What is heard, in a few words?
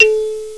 TANG